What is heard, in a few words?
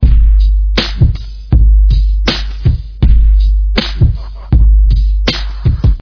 Heat Beats Chorus 80bpm